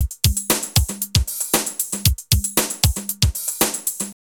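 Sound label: drum loops, right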